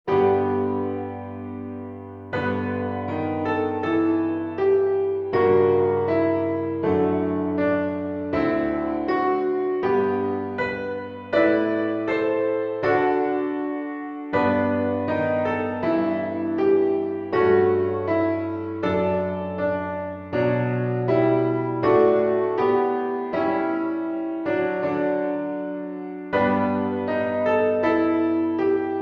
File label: Accompaniment Track; Ave Verum Corpus; SATB; Edward Elgar